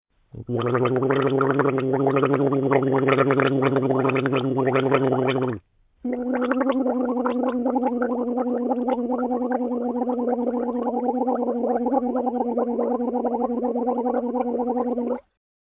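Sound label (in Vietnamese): Âm thanh súc miệng khi đánh răng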